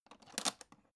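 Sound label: aluminium case small open short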